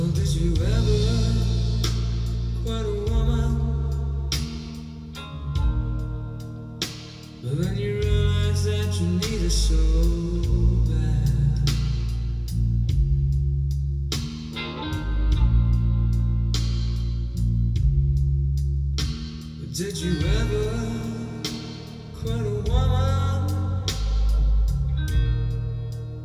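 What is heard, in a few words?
blues piano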